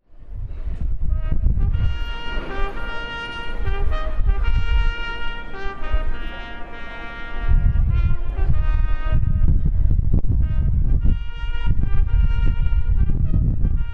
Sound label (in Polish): hejnał